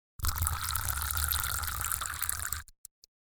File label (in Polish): NALEWANIE WODY DO KUBKA